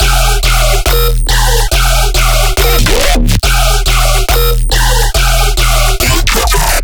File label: bass loop triplet b section 140 D#; Virtual Riot